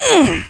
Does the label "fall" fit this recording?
Yes